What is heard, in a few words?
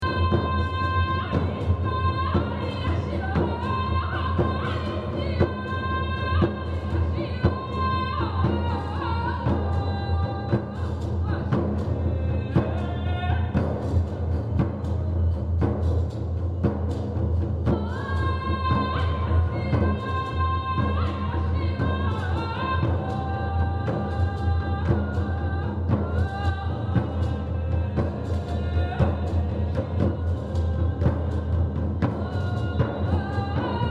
Drum Sample